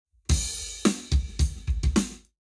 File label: Lab Wet, Drums 90deg